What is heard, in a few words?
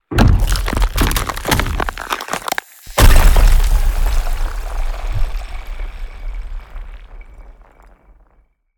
Iceberg, Designed, Impact, Crack, Shatter, Huge; Mattia Cellotto; Glacier Ice